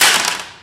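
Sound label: physics clipboard impact medium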